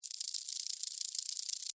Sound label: Beetle, Run, Insect, Bug; Beetles; Animals:Insects; Blastwave